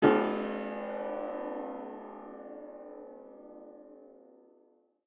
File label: shock piano hit; Joe's Diner